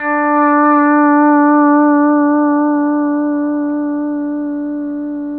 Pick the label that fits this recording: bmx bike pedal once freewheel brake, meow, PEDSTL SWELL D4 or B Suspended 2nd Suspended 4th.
PEDSTL SWELL D4